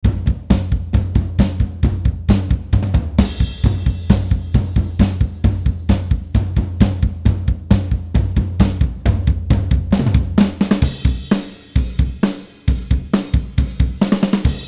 drum mix

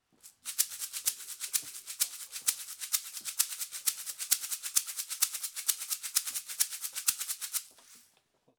xy shaker

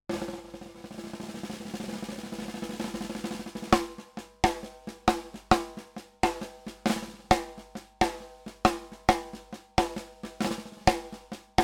rimshot; shurebeta; 98AMP

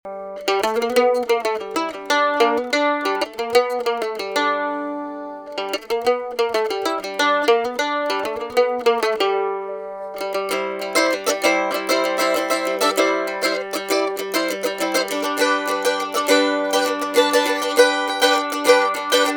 Mandolin

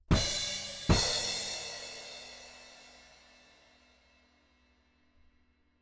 crashes

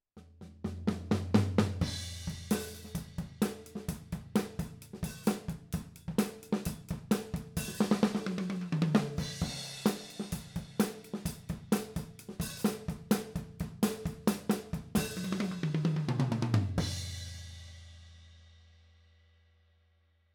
AT4047MP drums

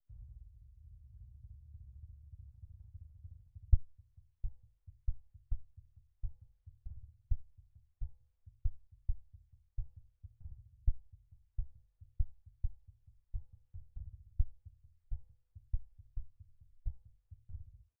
rimshot, LPF

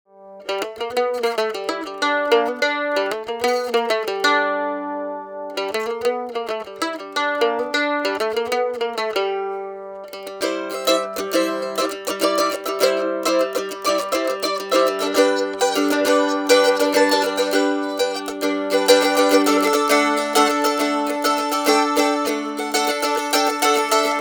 Mandolin; LCT-340

